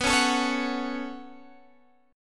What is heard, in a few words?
B 7th Suspended 2nd down Guitar Standard, chord; B7sus2; strummed; JGuitar